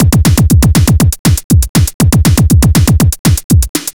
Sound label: techno